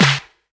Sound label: hand clap sound c sharp key, Royality free clap sound tuned to the C# note. Loudest frequency: 2187Hz; single hits one shots